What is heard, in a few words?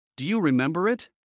Heart mid; Classroom humanbody